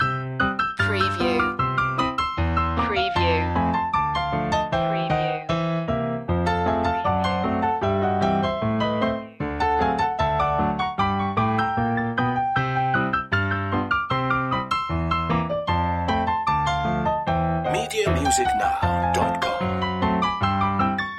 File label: limehouse rag loop